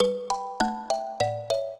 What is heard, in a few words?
minuet, mbira; Mozart